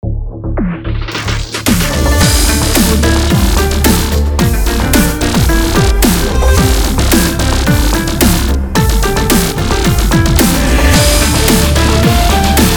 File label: chinese dubstep logo; petruality-nex